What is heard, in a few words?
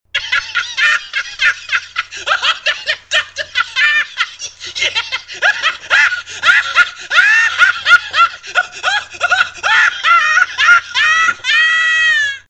Crazy laughter